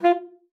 STXP F4, ALTOSAX; Xsample VOL-2

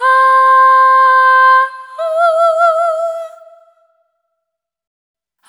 Shared Faith, vocal samples; Sonic Foundry (Sony Creative Software) - Pocket Diva World Class Vocal Samples; Op-Erratic Loops

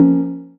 Fm Dub Chord

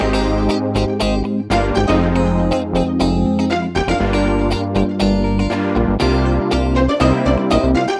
Backing, 120bpm; Zero-G; Nu Disco; Nicengroovey C

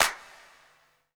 CLAP, Hip-Hop & R&B; USB Soundscan vol.47; AKAI

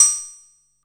SHARP PING; Zero-G Ambient 1; ANABELL PERC

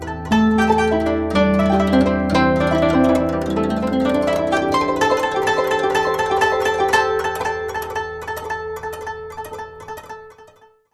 GUCHIN 90 C; INIS - Opium

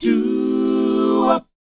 DOWOP D 4E, R&B & Dance; DOO WOP; ILIO; Vocal Planet

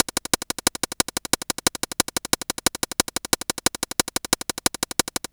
Hi Hat Loop

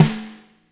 FAT SNR; Spectrasonics - Burning Grooves CD2

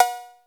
Bell; East West; Global Sounds; TR-808

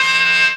RAVE, RAVESYN

